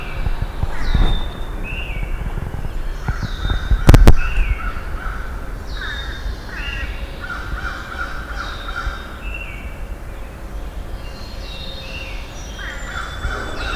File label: Corvus ossifragus; Fish Crow; MI-ThreeOaks; multiple Fish Crows were present; Michigan